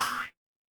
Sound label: cartoon boing